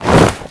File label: wing flaps light; movement; wings